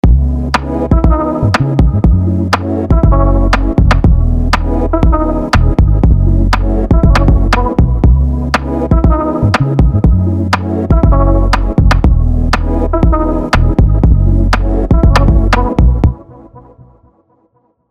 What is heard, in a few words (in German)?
Song nur Limiter